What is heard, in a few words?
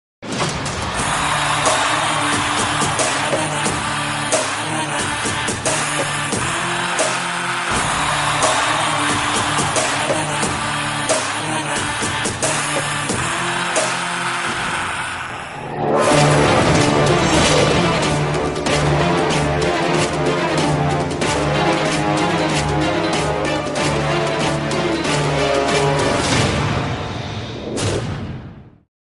mass bgm instrumental, telugu; pushpa; rashmika